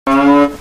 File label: Moo; Frijj